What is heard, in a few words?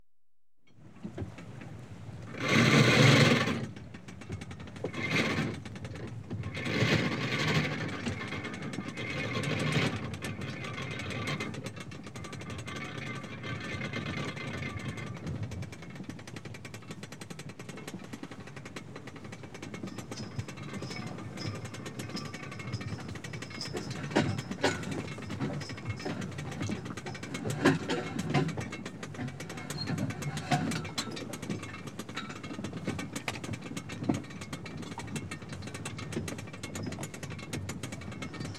Thames Sailing Barge, Going About; Ships And Boats; BBC Sound Effects Library; Ships And Boats 1